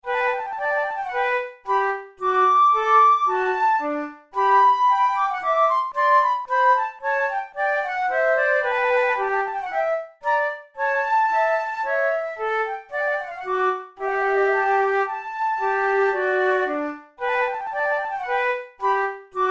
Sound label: methode de flute; tulou